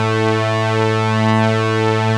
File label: OMNI STRIN, ARP Synths; E-MU Formula 4000 Series Vol. 3; Analog Odyssey; ARP Synths II